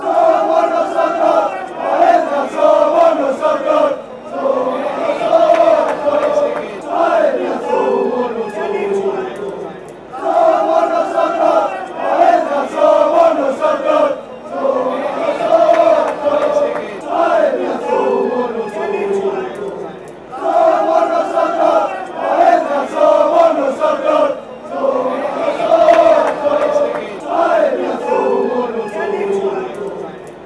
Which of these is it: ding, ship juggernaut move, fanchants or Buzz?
fanchants